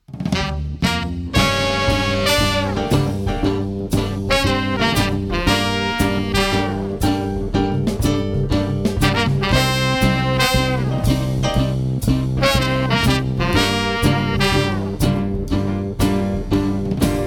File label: Blues in G Minor